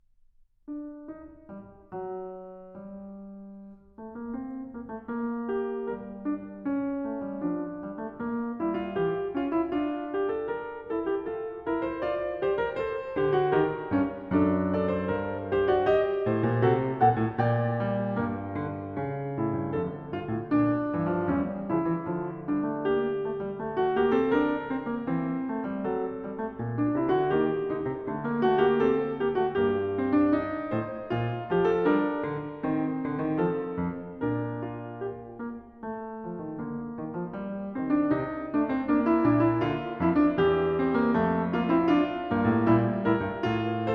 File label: Well-Tempered Clavier, Fugue No. 16 in G minor; Book 1; BWV 861; Bach